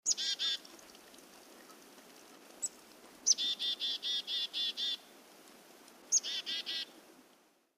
Chickadee New York; Chickadees

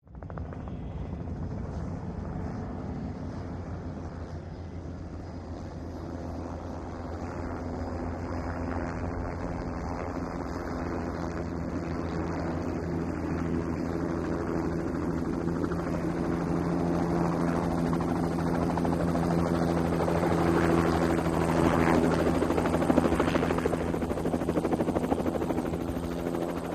Jet Ranger: In And Land; Jet Ranger: In And Land. Stereo

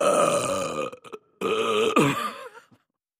Lrg Dbl Belch Laugh, belching

